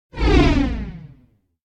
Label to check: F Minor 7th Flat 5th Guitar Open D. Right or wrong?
Wrong